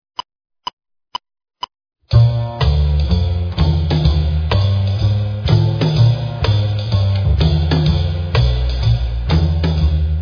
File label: Drumset; First Steps In Drumset